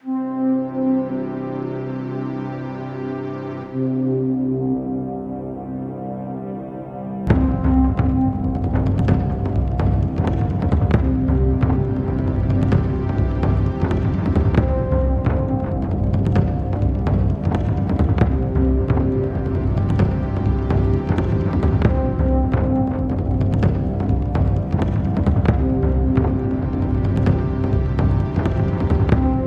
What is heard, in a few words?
Taiko Hell Funks Electronica and Flute